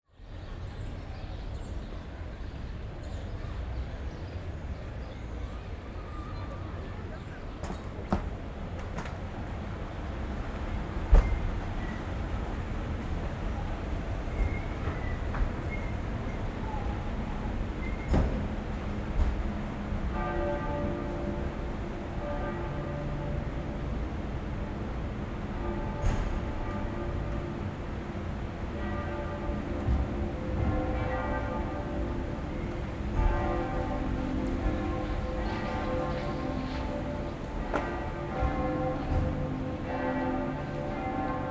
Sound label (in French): Souterrains Halles Parcours 2 porte du jour Porte du pont neuf